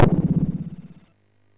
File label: SFX, MOS6581; Narco Police